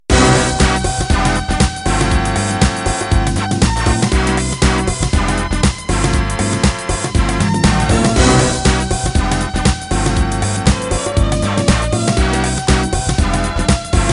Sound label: House Garage End